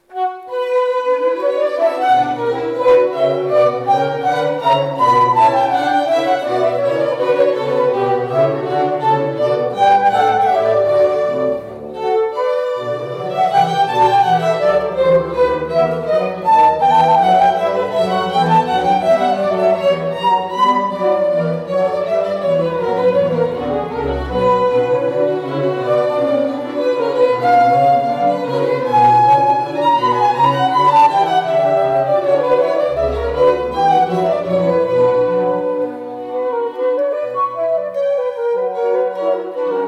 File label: Bourree; BWV 1067